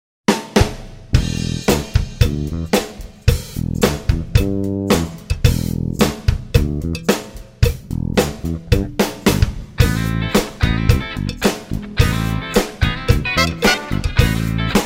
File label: Play It Funky; Soundzabound Volume 5 Disc 3